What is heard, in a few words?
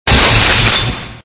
Smash Electric; android